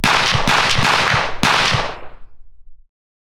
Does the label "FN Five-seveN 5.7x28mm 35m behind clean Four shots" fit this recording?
Yes